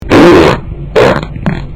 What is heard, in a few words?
fart